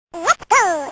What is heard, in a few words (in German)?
quietsch; exotic